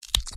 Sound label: Bone Crack; SoundsCrate